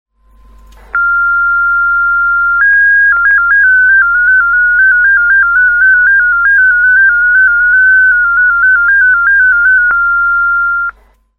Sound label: MFSK-8, digimodes